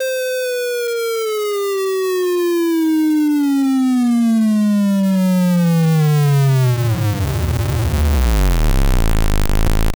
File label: 8bit stepping sound